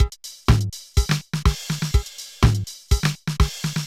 Loop, mxr; classic machines

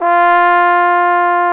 BRS FF BONE, Solo T.Bone; Roland L-CD702

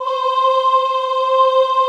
OHH C4, Classical Choir; Best Service; Extended Classical Choir

